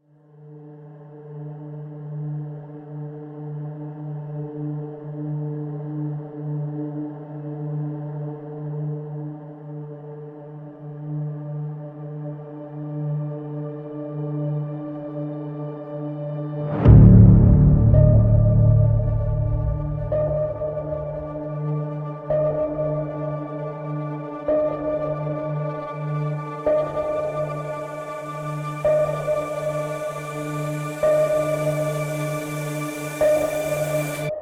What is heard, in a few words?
trailer music epic intense horror sound design